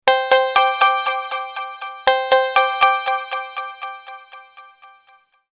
synthetic bell ringtone

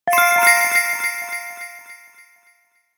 message tone

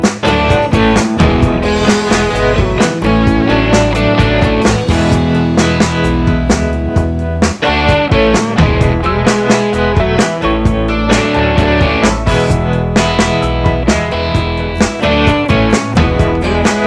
i m happy for this guitar ringtone

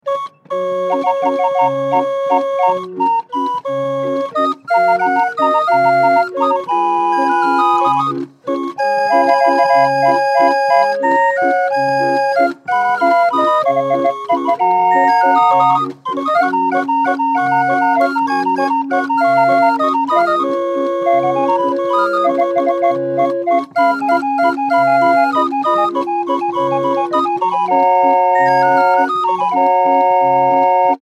la paloma ringtone street organ music

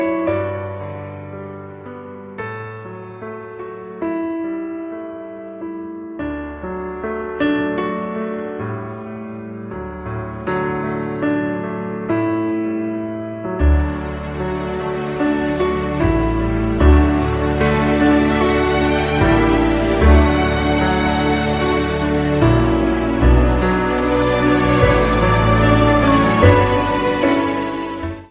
strange piano love ringtone

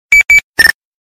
micromax sms ringtone

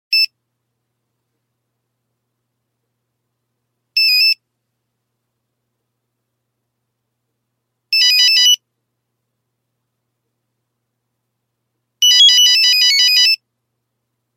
nokia attraction

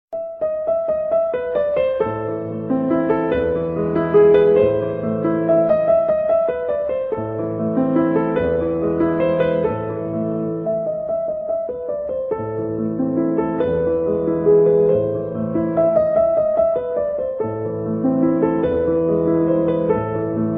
Old Classic Piano Ringtone

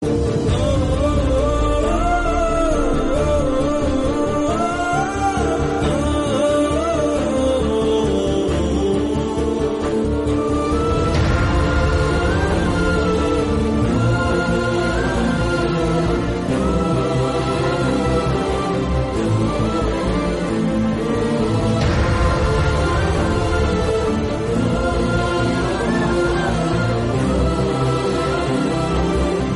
Telugu Movie Bgm; Mr Perfect

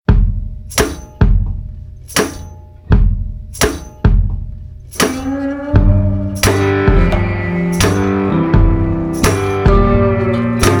vaculator blues ringtone

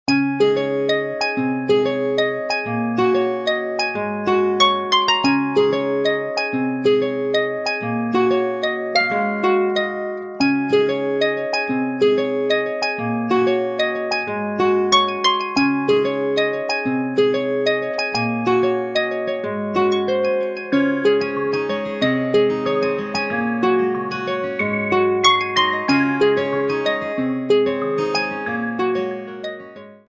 gentle morning alarm ringtone

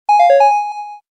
video game text sound effect

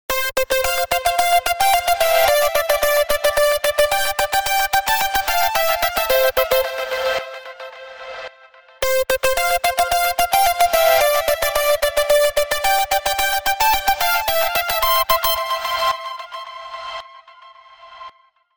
futuristic xmas ringtone

